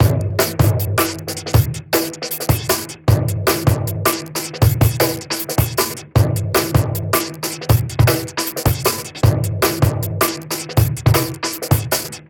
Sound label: Jungle Loop